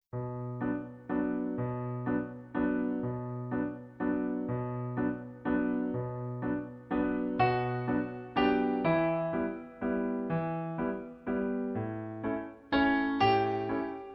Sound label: piano